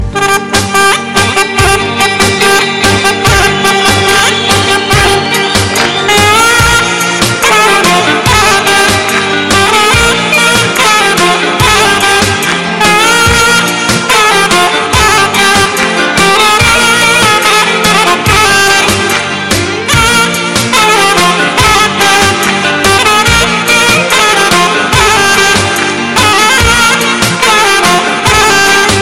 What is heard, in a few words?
Saxsophone